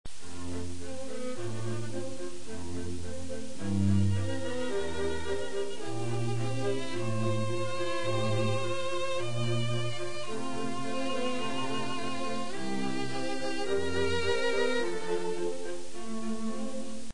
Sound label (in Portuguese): quarteto; mozart; completo